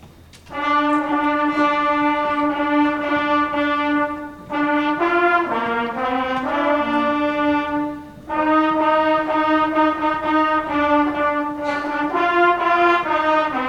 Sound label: Trumpet Class Feature